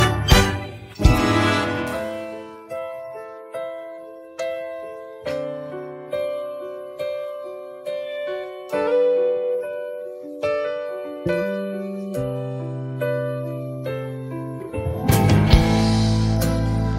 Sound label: Accompaniment Only